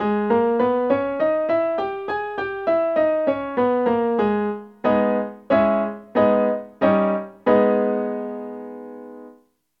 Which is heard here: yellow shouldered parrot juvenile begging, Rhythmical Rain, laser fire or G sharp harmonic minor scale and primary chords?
G sharp harmonic minor scale and primary chords